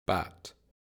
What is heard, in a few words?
bat